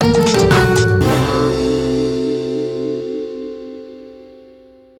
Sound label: Madagascar Fail Ditty; madagascar escape 2 africa; xbox 360; ps3; wii; ps2